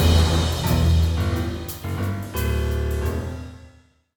Music Boss Battle Outro Stereo; Plants vs. Zombies Battle for Neighborville; MushroomBoss

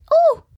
startle; Bretta

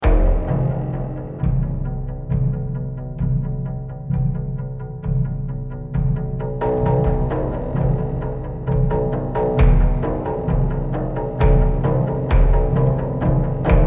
Tension Pulse High Loop; The Last of Us

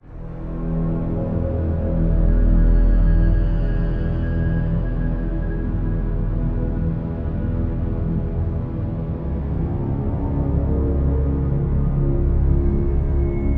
Ambiance Suspense; edge of eternity; EOE Ambiance Suspense V1

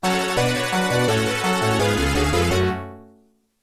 Outlane Jingle, pinball; F-14 Tomcat; Williams

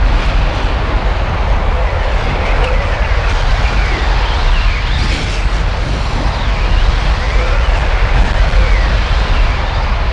Whirlwind Shield

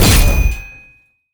Hit Combo

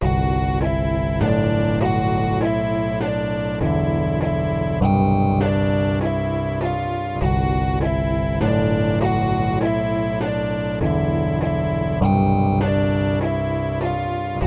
Underwater Amusement Park; yume 2kki

